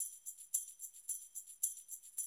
110, Classic Drum Loops

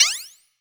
Swep Noiz; Roland SPD-S

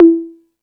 Beat Box; E-MU Pro-Cussion

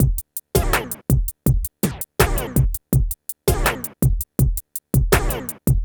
PHATT BEA

